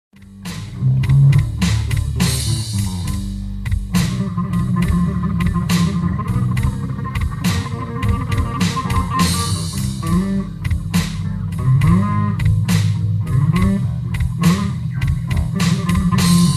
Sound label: blues